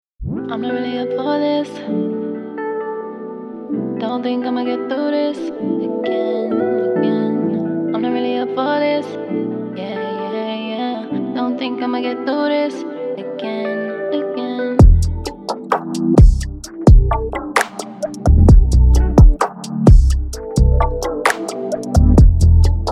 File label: RNB WAVES; Origin Sound; Late Night Vibes